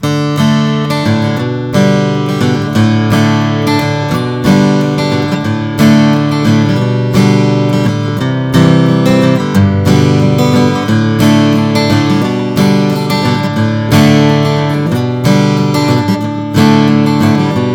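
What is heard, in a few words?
bourgeois db signature om adirondack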